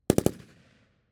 M1919A4 Browning Machine Gun .30cal behind blanks Triple shots; 5m; ORTF